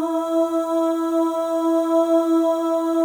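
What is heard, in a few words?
OH, female OHS, Voices; Symphony of Voices; Spectrasonics; FEM.1 OHS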